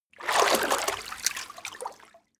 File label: water light